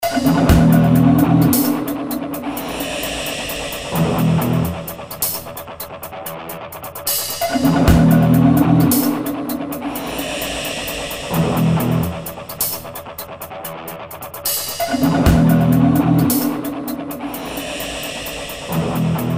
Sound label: loop ambiance; redbull